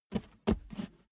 step barefoot metal scuff

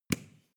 switch small turn off hard

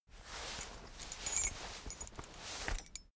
key ring small trousers pocket fetch

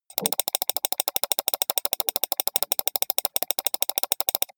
computer mouse click sequence steady long

